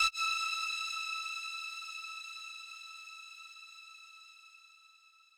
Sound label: lead